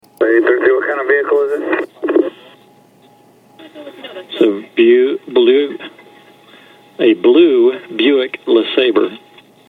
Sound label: blue buick